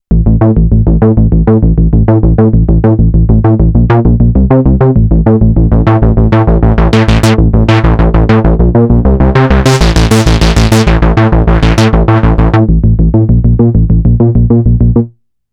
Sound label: aggro bass; novation peak test